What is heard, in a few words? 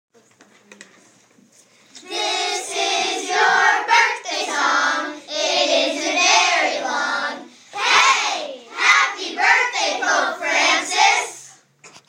happy birthday song